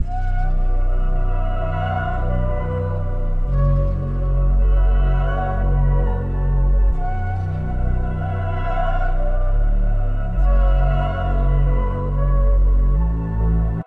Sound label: 139 BPM Layered; New Diamonds